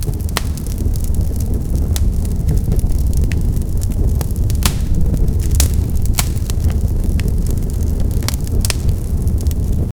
fire

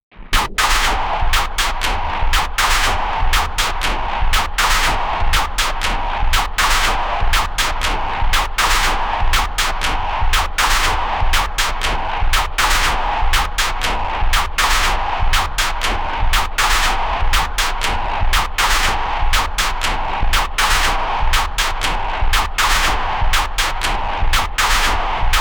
future war laser gun robo

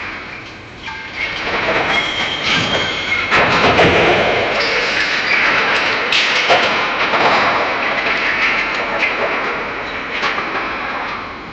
building collapsing earth; disasters

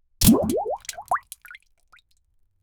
forceful nozzle burst on, bubble

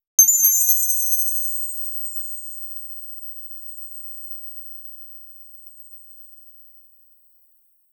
loot drop sound

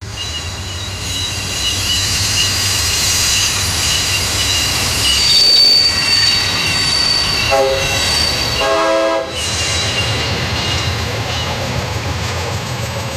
create sound of a train, accident

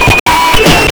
glitch

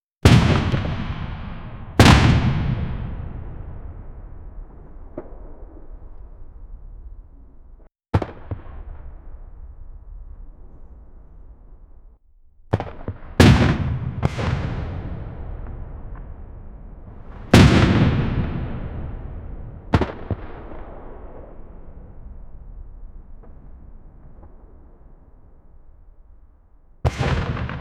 sounds of the battle grou, artillery

